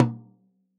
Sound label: soft hit normal